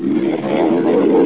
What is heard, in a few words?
idle, monsters, screamer; AoMClassic